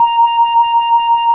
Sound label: ORG VOX A#; Roland LCDP09 Keys of the 60s and 70s 1